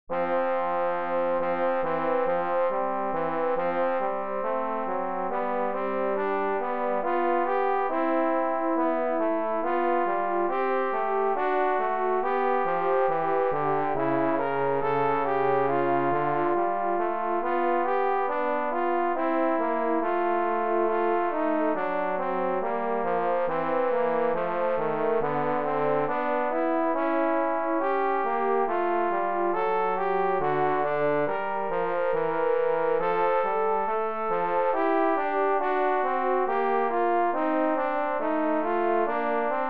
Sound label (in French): duo pour trombones avec accompagnement